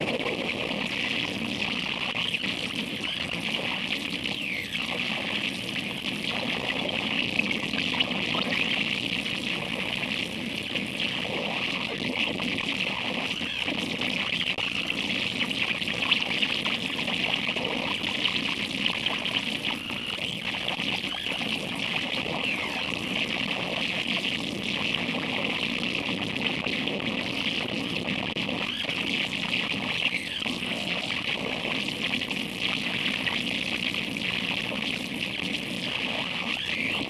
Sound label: Music Vehicle Radio Events